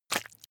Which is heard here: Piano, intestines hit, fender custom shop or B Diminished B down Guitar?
intestines hit